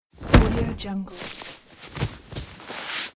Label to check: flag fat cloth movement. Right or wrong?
Right